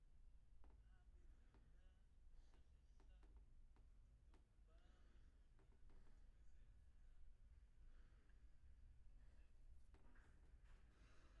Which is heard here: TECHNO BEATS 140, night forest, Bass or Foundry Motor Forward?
Bass